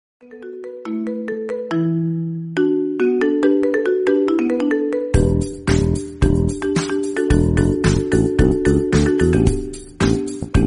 Marimba Ringtone Remix

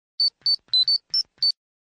Power Ranger Wrist Communicator

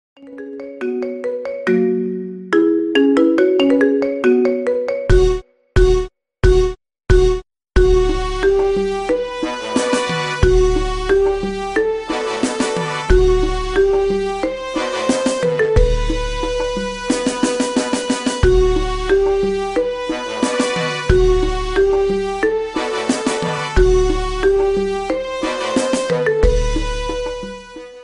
pep rally marimba remix

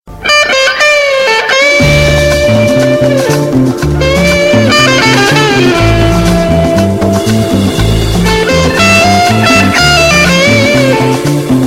instrumental

